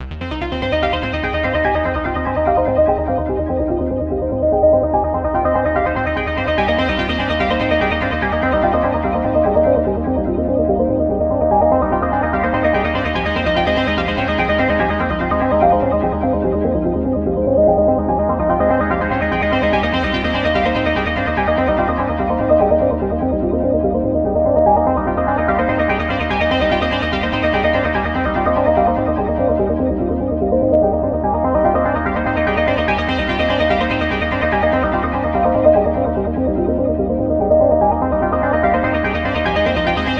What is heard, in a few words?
Bent Square Waves; Garmonbozia; Oscilot